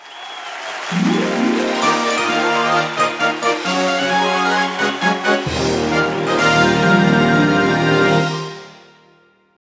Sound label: Jingle Max Score